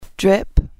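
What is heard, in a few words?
Boiler Vibrating, noisy boiler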